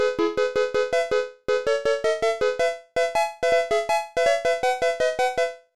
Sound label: Jingle, CLASSIC FROG DOCK; Frogger Helmet Chaos; nintendo ds